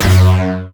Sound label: Shield Generator Deactivate